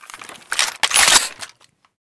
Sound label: g28 misfire, weapons